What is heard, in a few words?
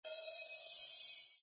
nav sparkle